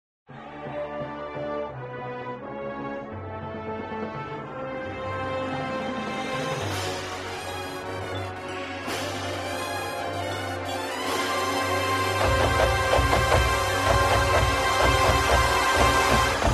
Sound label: symphonic cantata opening